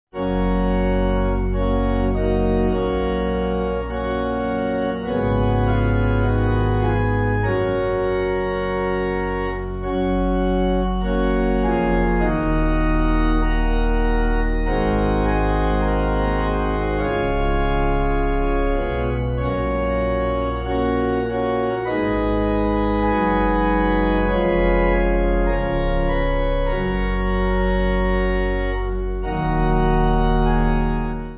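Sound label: Pipe organ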